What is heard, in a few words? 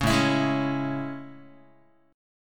A Major 7th A, Guitar; AM7; strummed; JGuitar